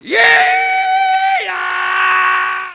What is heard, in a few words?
YEE YAA!!